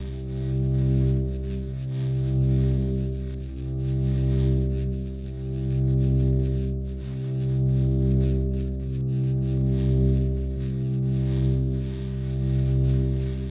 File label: beacon; factorio 2.0.55